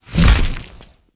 WING FLAP

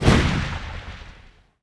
FIREBALL COLLIDE

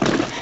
grind

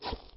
scratch small

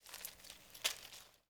RUSTLING

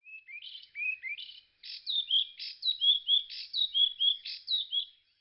bird

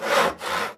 SAW

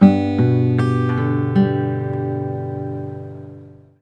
LUTE

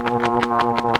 WINGS; mahlendur

